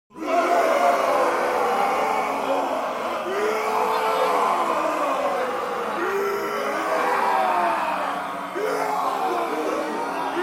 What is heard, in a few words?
monster scream